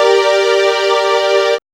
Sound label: SYNTH; E-Lab; X Static Goldmine 1